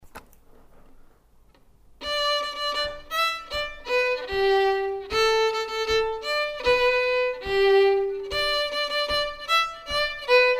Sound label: Square Eight, fiddle; Kit White's Square Eight